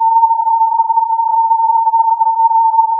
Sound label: filtered input